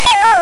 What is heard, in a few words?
bip hello